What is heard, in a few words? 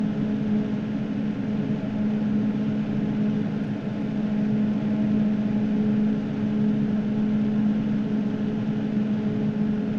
Max RPM